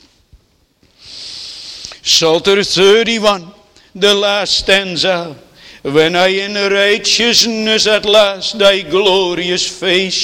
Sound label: Singing; 1 john 3 1 3